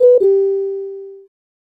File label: Unused Jingle